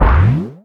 CARTOON Boing; mono; Universal Sound FX

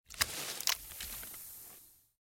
Corpse; Blind Quest - The Frost Demon

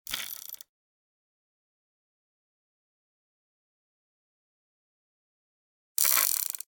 .22 Western Pistol Chamber Spin; Hollywood Edge; Heavily Armed

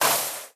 bite; Apotheorasis

